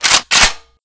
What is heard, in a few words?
assault pump